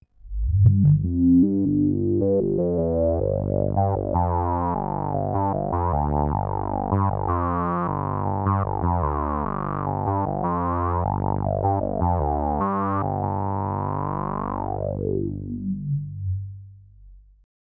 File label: bass close evol; davesmith mopho